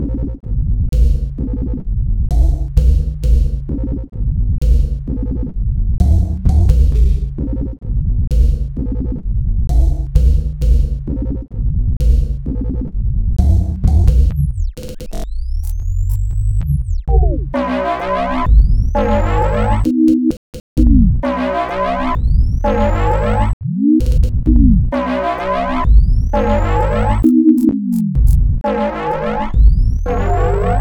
Sinewave Project

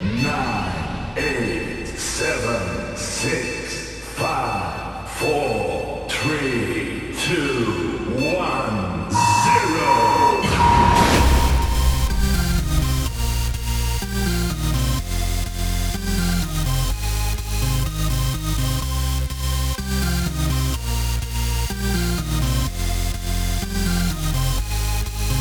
Sine Wave

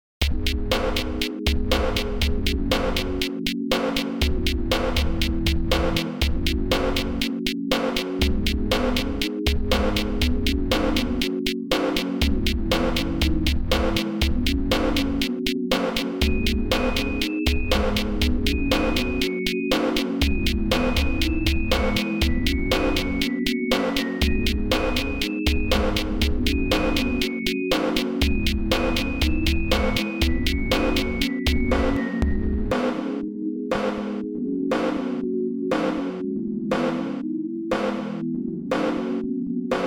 Sine Project

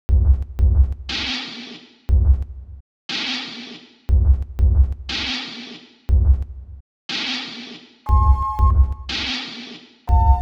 sine wave

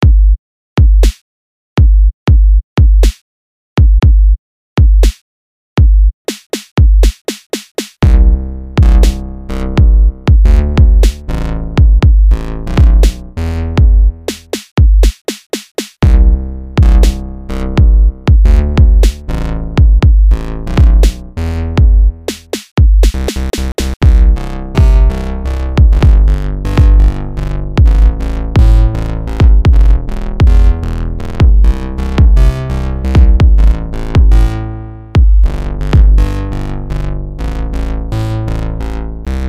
Sine Wave

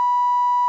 Sinewave

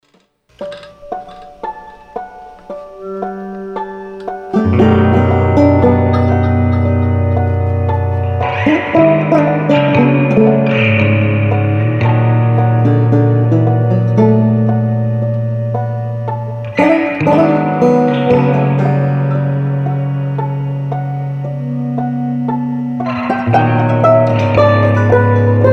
Wooden Washburn; Wooden Wolf

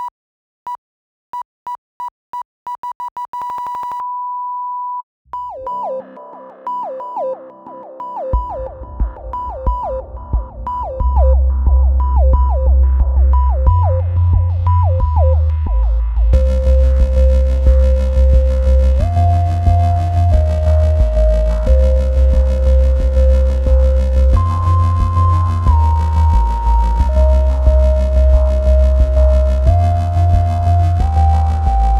Sinewave Project

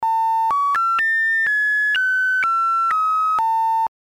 sine wave test

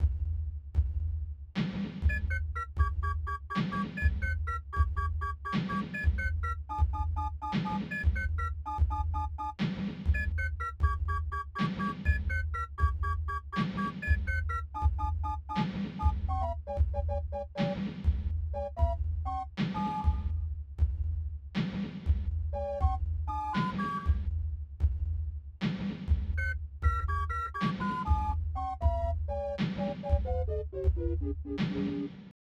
sinewave

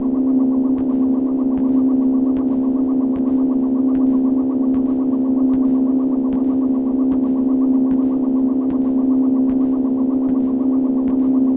Sine Wave

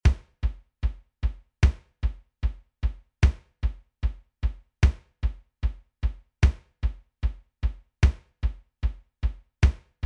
sinewave bounce

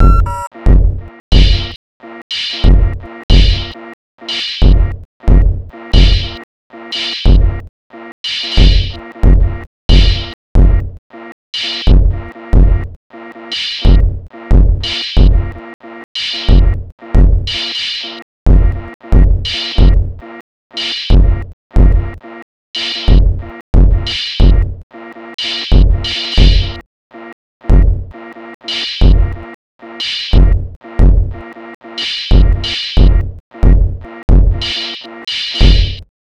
song

